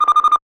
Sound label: buzz in